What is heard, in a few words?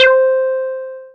Synths BASS